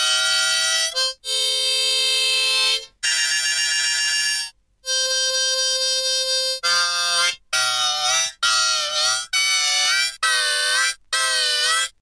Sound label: Harmonica